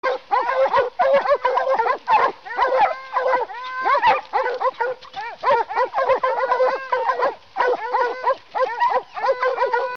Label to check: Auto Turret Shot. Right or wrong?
Wrong